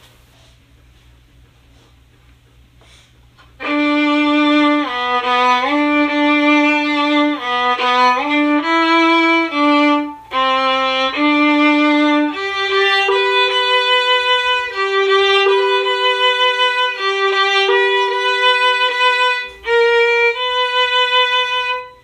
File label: Waltz Violin Harmony; christmas concert practice clips